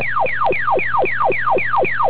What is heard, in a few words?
eyes, PacMan